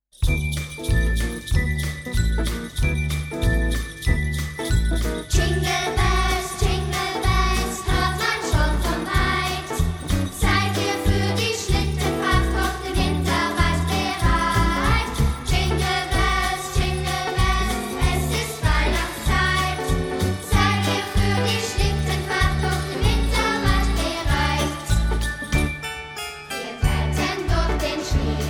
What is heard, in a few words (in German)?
Jingle Bells Ges